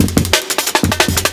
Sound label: compressed, Processed breaks; lolicore